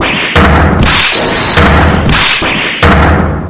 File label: dance medium; souseki